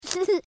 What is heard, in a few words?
giggle; cutiepugs